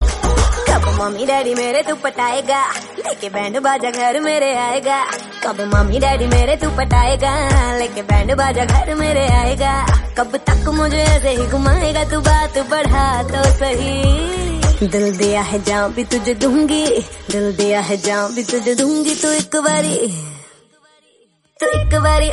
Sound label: cute love ringtone